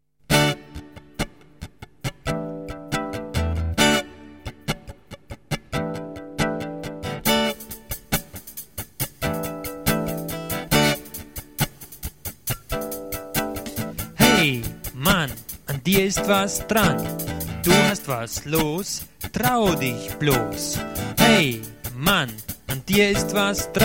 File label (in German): Rap